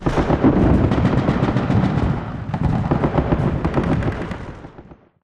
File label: scripted distant fog battle; Titanfall 2